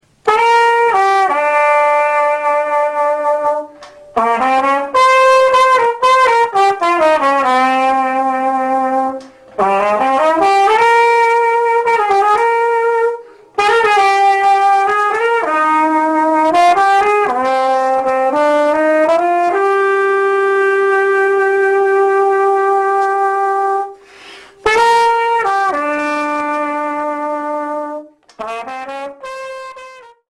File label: bugle